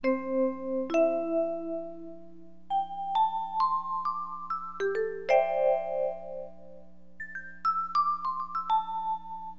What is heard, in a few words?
VIBES; LiveAct Pool; AcidJazz Funk; Keyboards